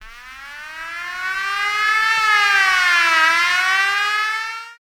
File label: ALARM